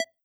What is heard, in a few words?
move; cube-tube